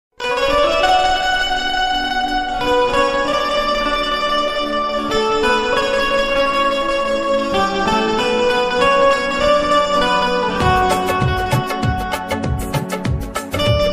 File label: desh bhakti ringtone; republic day ringtone; 26 january ringtone; happy republic day